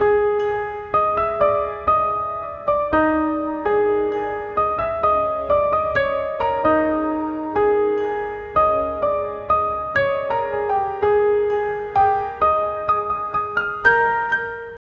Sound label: measured and mystical, noir